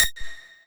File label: lead